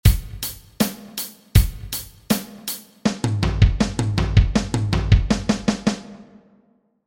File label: fill